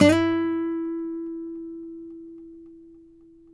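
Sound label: nylon, FLAMENCO, Guitars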